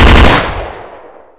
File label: m4a1 fire burst